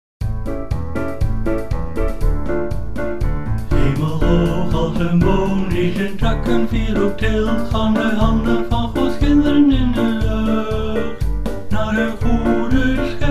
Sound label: backing; New Hope Music